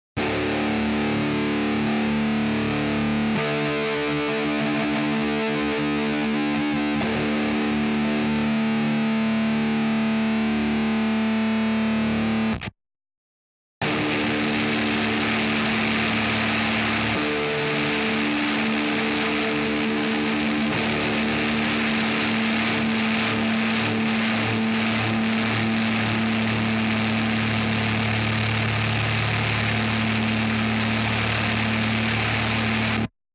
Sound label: guitar